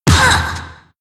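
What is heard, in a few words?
meerking damage up, monster; Senillinea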